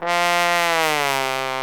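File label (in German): POSAUNE ABGANG F2; Best Service; Alpine Volksmusik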